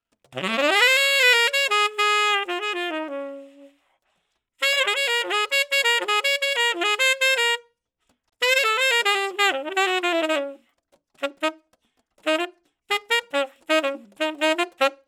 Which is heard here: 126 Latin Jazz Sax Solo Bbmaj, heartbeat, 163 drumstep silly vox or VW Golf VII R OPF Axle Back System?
126 Latin Jazz Sax Solo Bbmaj